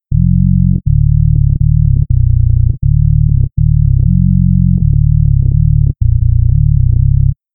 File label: bass whistle bass only